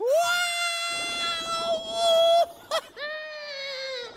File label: Wow Mhm